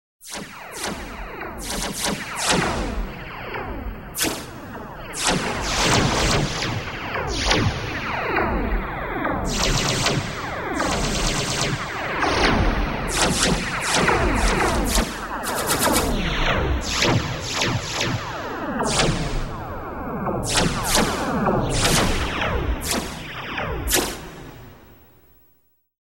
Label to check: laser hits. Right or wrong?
Right